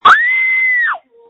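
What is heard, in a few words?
Scream, phone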